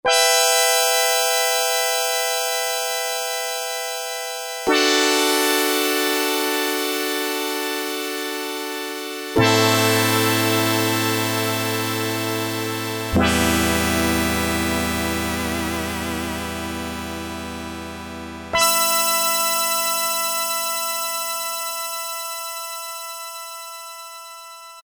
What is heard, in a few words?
Thin Sound, Korg PolySix; Factory Presets Bank A